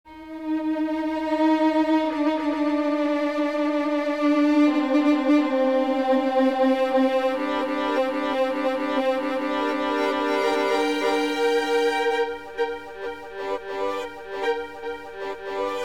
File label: classica